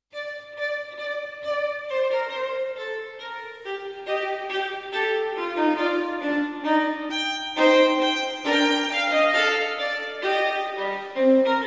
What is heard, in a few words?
Sonata in G Minor for Solo Violin; No. 1; BWV 1001